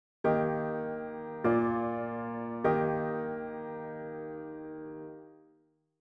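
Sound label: Eb major PAC; melodic dictation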